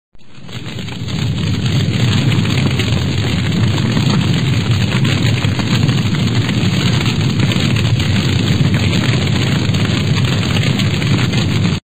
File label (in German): Feuer Geknister; Natur